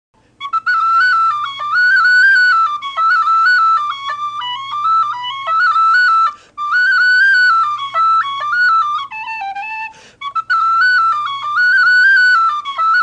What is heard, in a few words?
burke, aluminum pro soprano d